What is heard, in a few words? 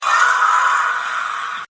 thunder drum